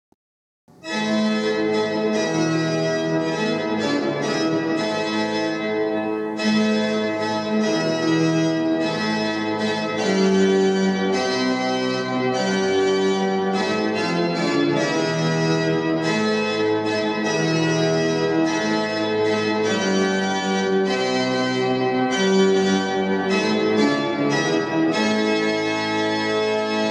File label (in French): Morceau d'orgue